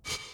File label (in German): menu leuchteffekte; magic 2014